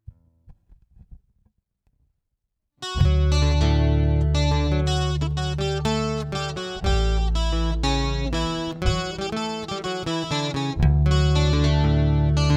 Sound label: Polka